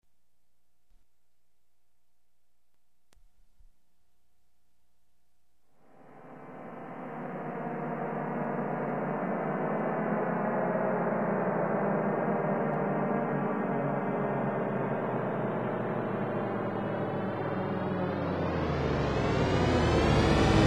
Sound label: Bass Surround Sound Test Speaker Test; Lucasfilm THX